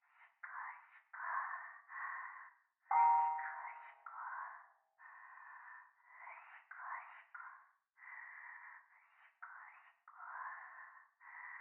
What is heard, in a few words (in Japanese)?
トイレ しこしこ 寺